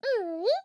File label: koala med